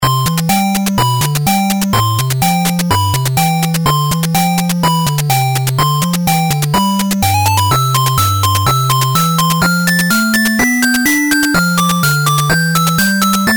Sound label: chiptune